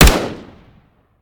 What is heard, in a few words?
fire, weapons; gmod; viper